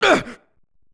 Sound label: evil pain; buu